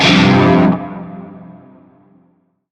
Shawzin Pent Min Chord; Warframe; Narmer Shawzin; Chords

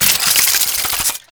Ink Rifle Alt Mech; Warframe; Tenno

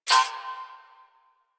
Energy Ball Charge; Mine Osprey

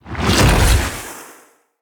Dendrit Staff Caster Cast Long; Weapons; Staves

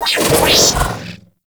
Auto Turret Open; Venus; Turrets

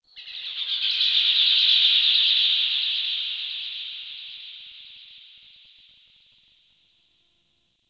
Forest Distant Bird, Ambience; Warframe; Grineer

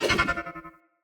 Spin, Swords; Weapons; Enemy; Mockery Swords; Stultion

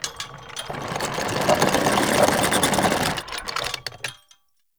Siege Flag Lowering; Midrath

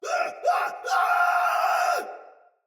Pain Heavy Soldier Sword Agent Male; Battle; Sinecure Melee

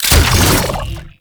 Mech Stop, Weapons; Warframe; Corpus; Tenet Quanta

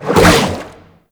Aqua Board Jump; Warframe; Yareli

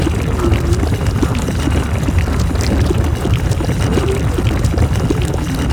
Bowl Ball Loop; Warframe; Grendel

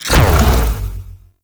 Zanuka Companion Stomp Burst; Warframe; Characters; Abilities

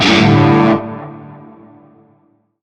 Shawzin Pent Maj Chord; Warframe; Narmer Shawzin; Chords

